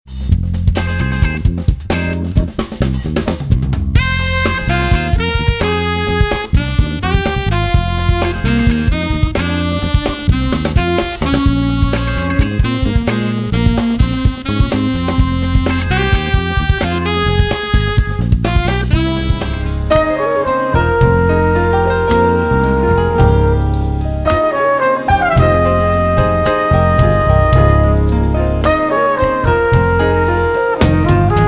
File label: Fusion Medley